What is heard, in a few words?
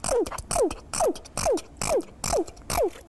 Excessive Sneezing